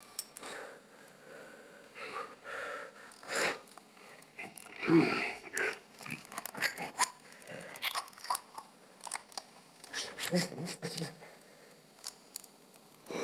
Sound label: close up wolf gnawing and breathing; animals